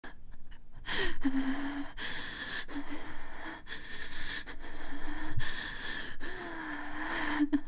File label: panting